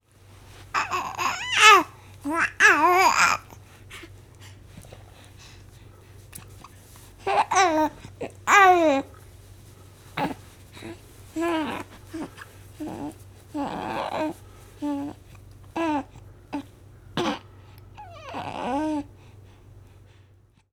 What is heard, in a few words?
Baby talk hiss